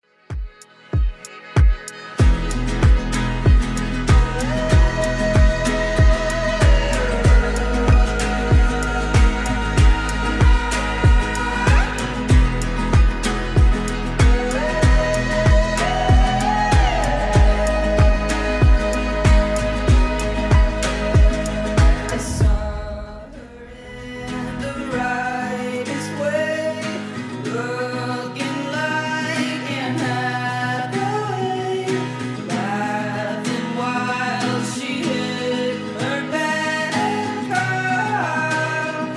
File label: Top40 Redrum 95bpm